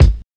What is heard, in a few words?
hot kick one shot G sharp key